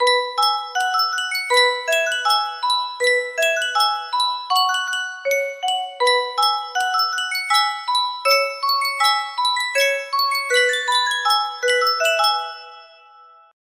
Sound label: sankyo music box